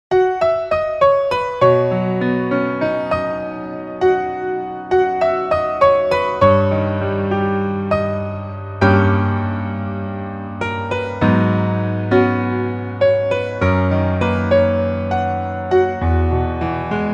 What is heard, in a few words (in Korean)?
Piano ver 멜로디MR -1키